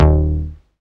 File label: Old Bass; Best Service Gigapack 1